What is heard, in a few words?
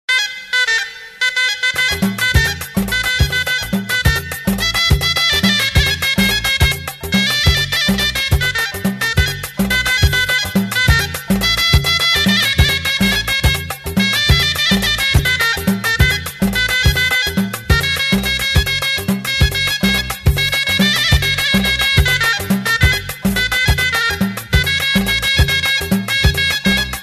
Moroccan Music